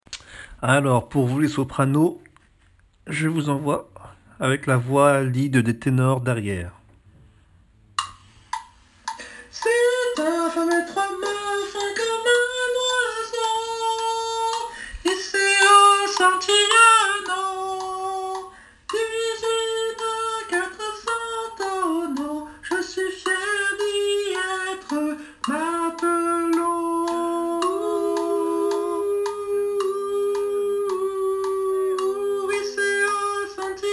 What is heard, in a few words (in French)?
Santiano Soprano